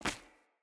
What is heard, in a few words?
Run Stone, Footsteps; Characters; Mother